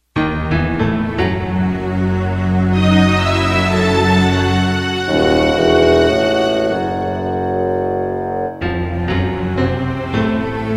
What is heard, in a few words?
mystery orchestra